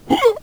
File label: axe thrower attack; propack